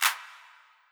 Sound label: Clap Add, 128BPM; Drum Loop; One Shots; VEC5 Multi Loops